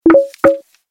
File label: sms ringtone; europa ringtone